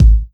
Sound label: clear kick drum g sharp key, kick drum sound tuned to the G# note. Loudest frequency: 109H